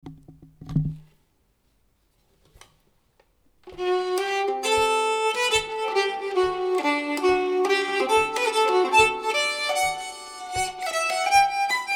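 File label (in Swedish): gånglåt från Mockfjärd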